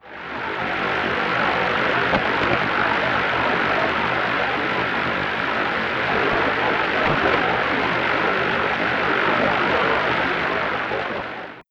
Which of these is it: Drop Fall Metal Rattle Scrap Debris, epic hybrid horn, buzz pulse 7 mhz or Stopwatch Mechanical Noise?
buzz pulse 7 mhz